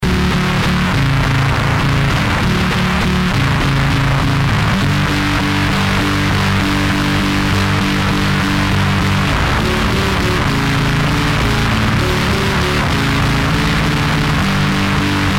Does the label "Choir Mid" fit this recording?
No